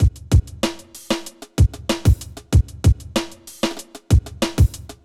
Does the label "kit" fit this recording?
Yes